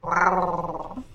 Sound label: angry purr